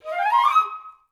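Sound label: flute run up D#3; Best Service; Peter Siedlaczek's Advanced Orchestra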